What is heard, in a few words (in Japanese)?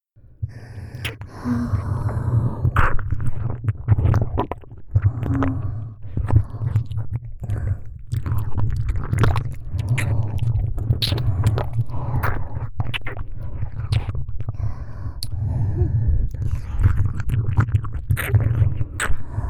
極・耳塞ぎ動き回り舐め ループ 両耳, ASMR